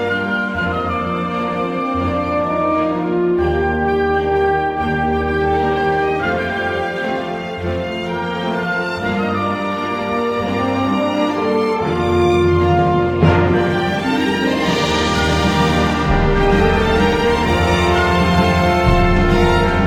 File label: Orchestra Instrumental Version; KHIII